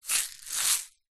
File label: salt rock in plastic shaker tip movement; zapsplat